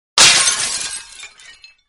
Broken Glass Sms

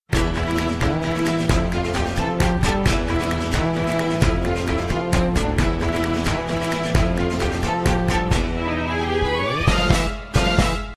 Latino Guitar

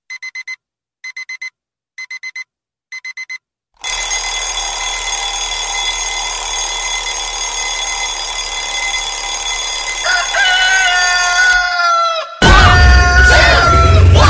Chicken

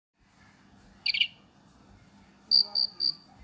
Cricket

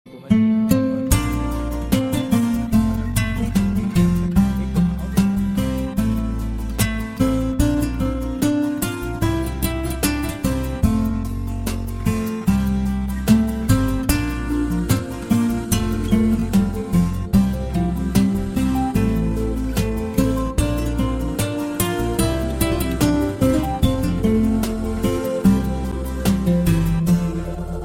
Perfect Guitar

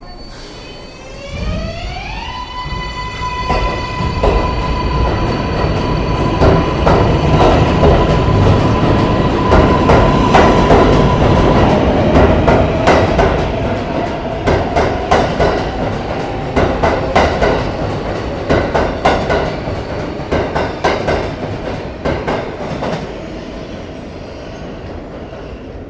Train

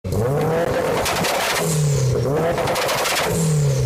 Evo 9 two step

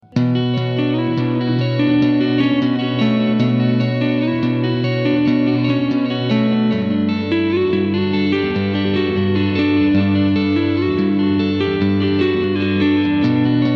Delay

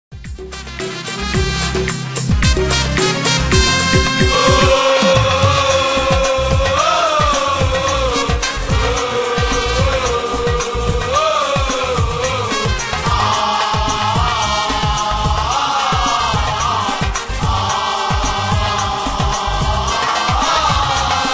Audience; Verdeleone

